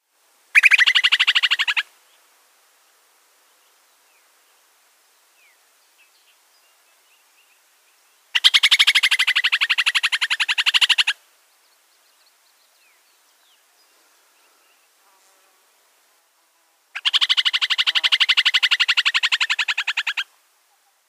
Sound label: Upland Sandpiper calls